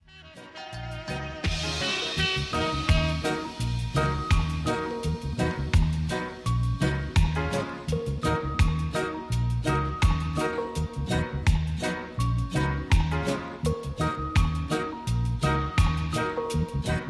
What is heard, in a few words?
Drum and Bass Dub